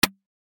Menu Button; Bunnicula - Crop Control

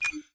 ui quit; Ben 10 - Spot The Difference

